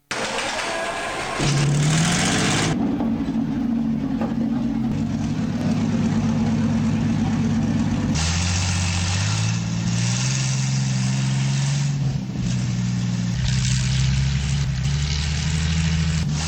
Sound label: King Tiger Tank